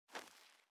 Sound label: jump generic